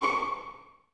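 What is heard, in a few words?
violins C#6 pizzicato, String Ensembles; Miroslav Vitous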